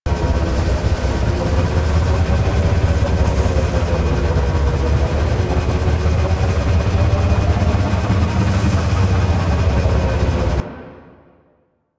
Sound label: Corpus Dropship Loop; Warframe; The New War; Drifter Camp